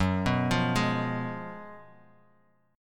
Gb Major 7th Suspended 2nd Suspended 4th, down, Guitar, Drop D